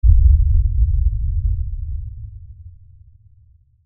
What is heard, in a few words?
LFE Explosion, Lfe Explosion Low Bass Blast Rumble; Blastwave